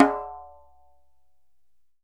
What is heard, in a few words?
DJEMBE; E-MU Producer Series Vol. 7 – Old World Instruments; Drums&Percussion